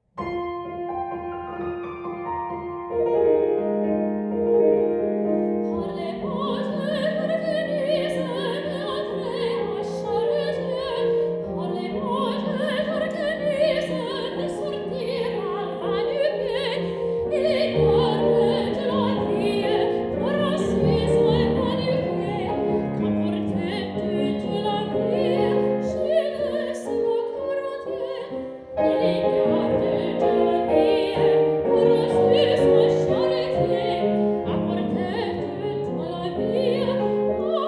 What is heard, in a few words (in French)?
Chanson d Orkenise